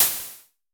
SN Basic, Vermona Mono15 Drums Snare

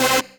RAVE, RAVESYN

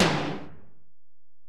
Tom, Alesis DM-PRO, Drum Machines, Toms

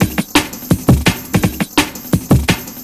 Beat, Futurist Drum & Bass; Drums & Percussion

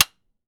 clapper, American Natives; E-MU Formula 4000 Series Vol. 4; Earth Tones

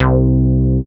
C3, BASS; Q Up Arts; Dream Experience; BASS BANK 11

